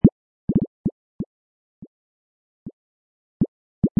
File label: chimes delay; nyquist